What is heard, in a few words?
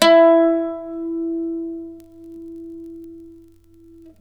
e3, Mandolin; Guitar; Terkelsen